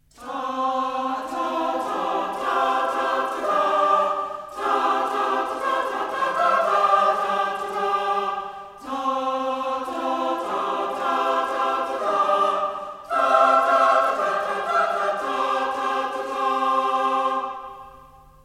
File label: Fanfare